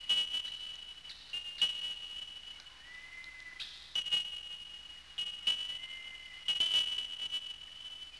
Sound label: bird